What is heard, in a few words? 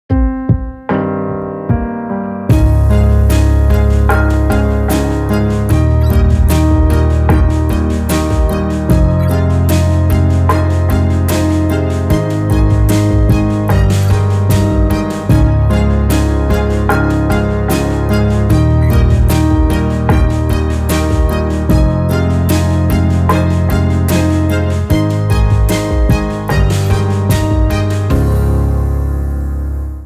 INSTRUMENTAL